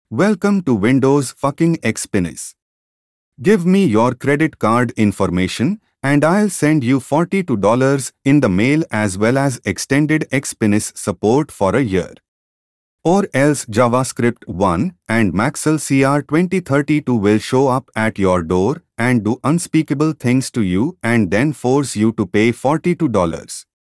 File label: windows xp startup indian